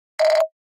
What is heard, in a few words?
Flash Gear Multi Holl, flash gear